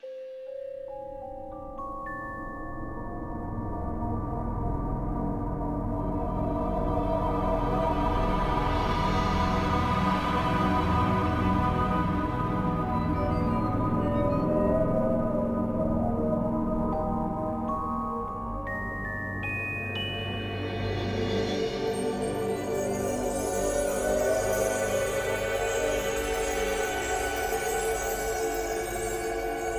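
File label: Music Ambient LOOP; bolt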